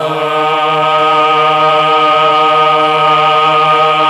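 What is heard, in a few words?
Mellotron Choir D4; Best Service Gigapack 1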